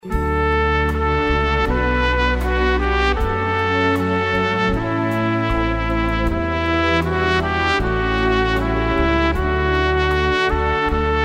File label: trompet; boern og voksne i kaerlig